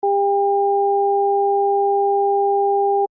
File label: alarm